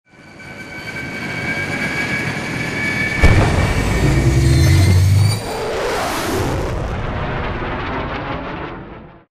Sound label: dropship fly away; Titanfall 2